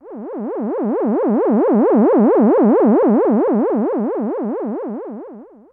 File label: E-MU Moog Synth